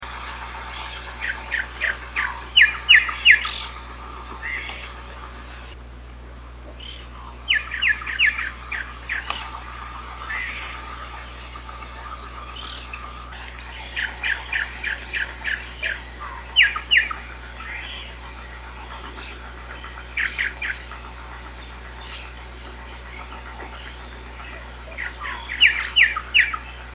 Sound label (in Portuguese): irauna de bico branco, canto